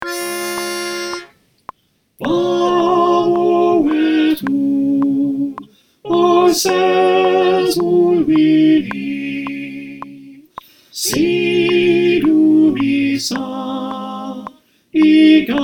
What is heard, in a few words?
Alto with click